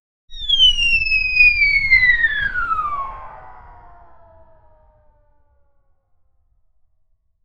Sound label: the sound of a bullet whizzing by with a sharp whoosh; firearms